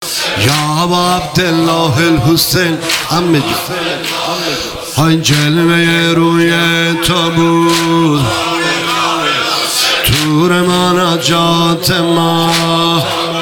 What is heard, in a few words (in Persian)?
مداحی